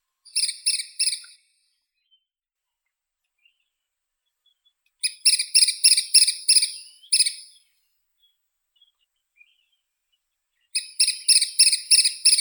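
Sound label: woodpecker, Dendrocopos canicapillus; dyatel bolshoi ostrokrilii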